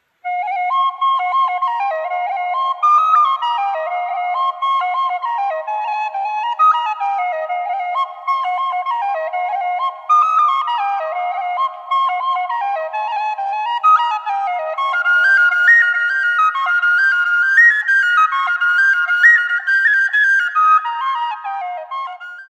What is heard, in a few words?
keyed flute; فلوت کلید دار